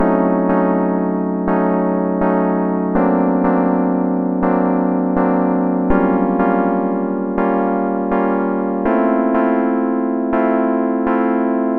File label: 122 BPM Tonic F Amaj Gmin Chord Progress; mmb chord progressions essentials